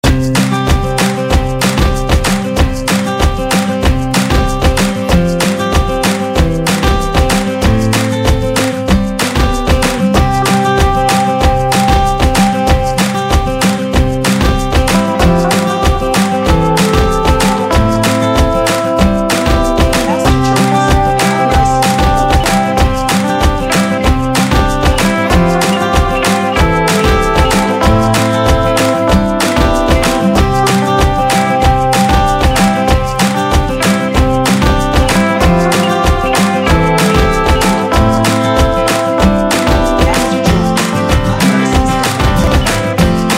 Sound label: Lovely Sunshine Optimistic Acoustic Upbeat 95bpm